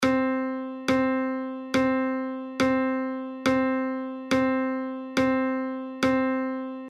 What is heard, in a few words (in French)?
noire, la rythmique